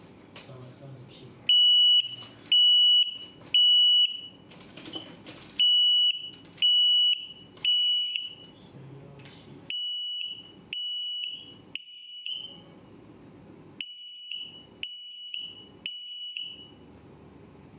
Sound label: Alarm-sound, rauchmelder